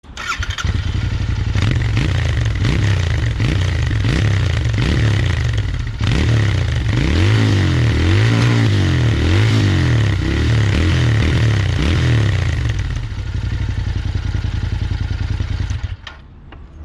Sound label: RE Continental GT650